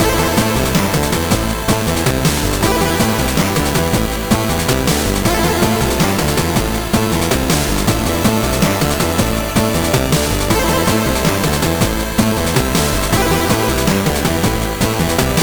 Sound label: Excite Loop; high strangeness; wii u